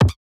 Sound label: glitch perc; drums; perc oneshots; bespoke - Floral v.2